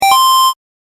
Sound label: coin